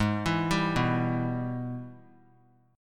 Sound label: G sharp Minor Major 7th Sharp 5th G sharp down; arpeggiated; JGuitar